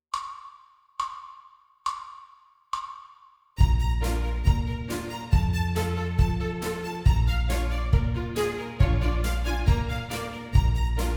High Interval Study

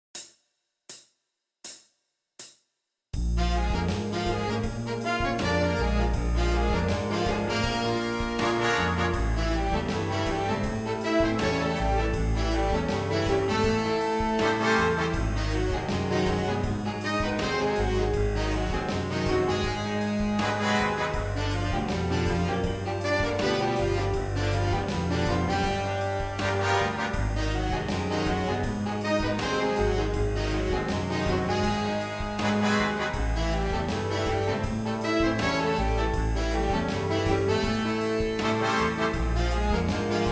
Lip Slur

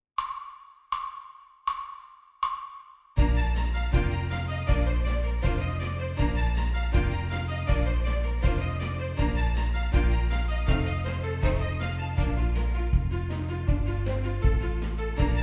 Five Step Scale Study

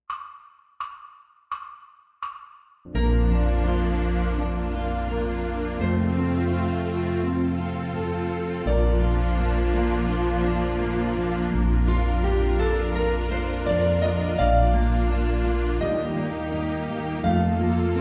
Concert Bb Pentascale

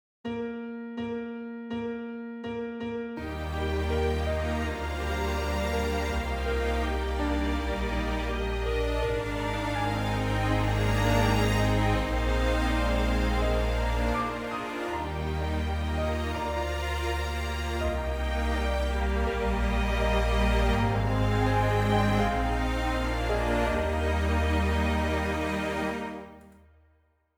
New World Symphony Melody

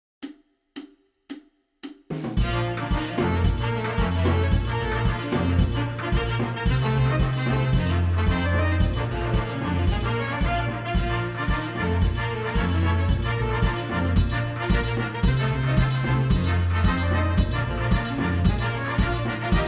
Haitian Folk Song

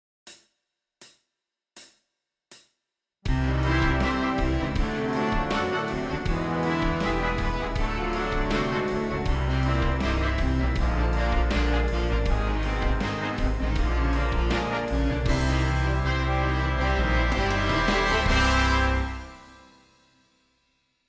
Basic Daily Warm up B Horns in Fifths

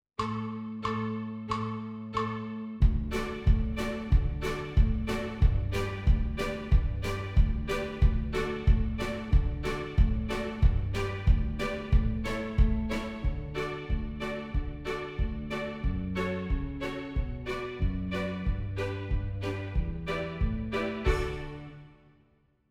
Finger Roll Horns in Fifths

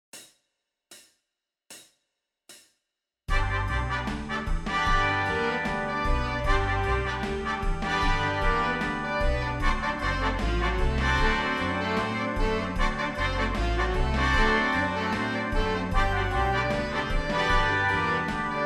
Exercise

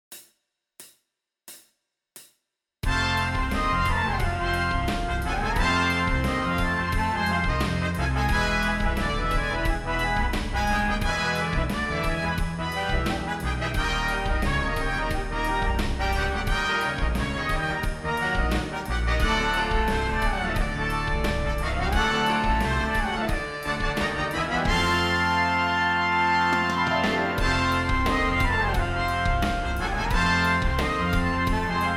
Lip Slur 3 Horns in Fifths

